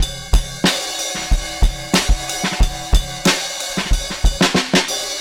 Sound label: 92 Desert Full; Touch Loops; LA Beat Scene MiniPack